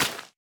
湿草挖掘声

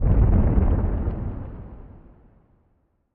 下界荒地氛围声; ambient

